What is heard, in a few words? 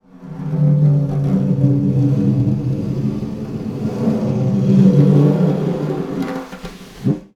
Snare Drum; Percussion Samples